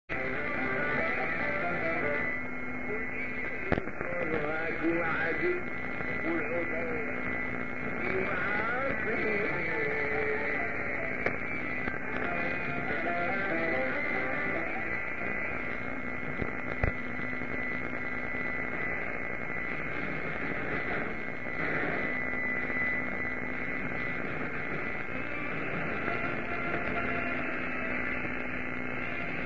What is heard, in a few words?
Algeria anthem